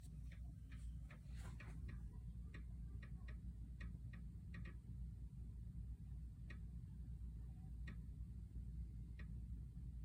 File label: Bathroom Clicking Noise; noise diagnosis